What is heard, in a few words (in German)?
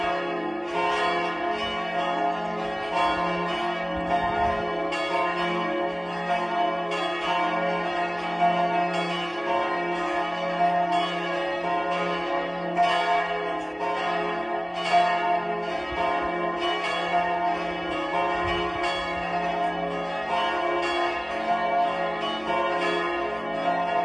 Glocken Ostern; Lichtenhagen-Dorf